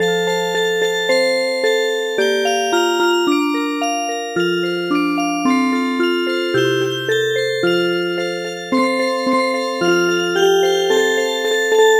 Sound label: Music Box; Tanabata sama